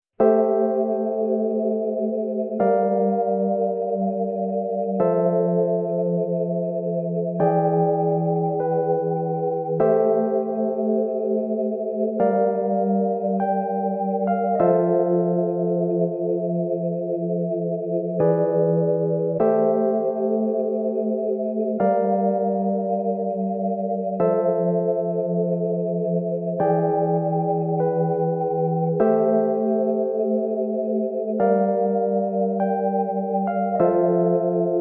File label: No Perc No Vocal